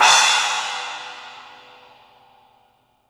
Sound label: CYMBALS; 3D Sound; 3D Drums